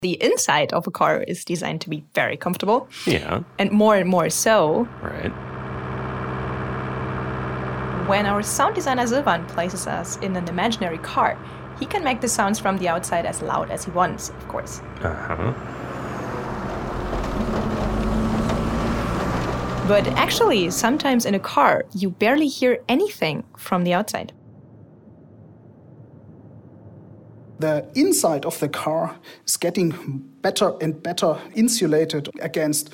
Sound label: siren detection audio; embedded siren detection